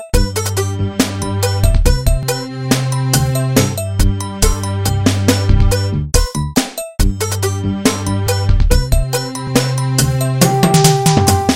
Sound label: elevator music